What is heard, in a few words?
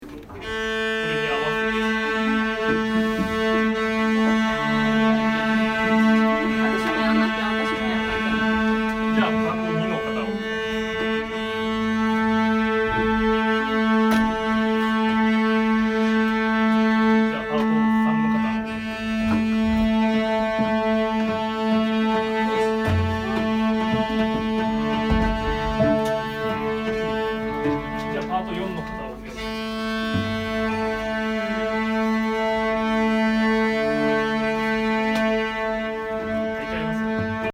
tuning